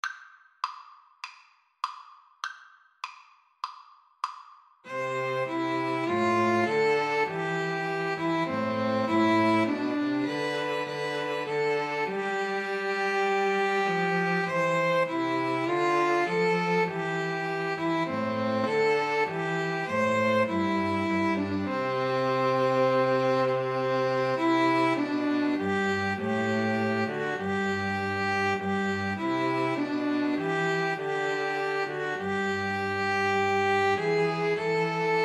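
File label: string trio karaoke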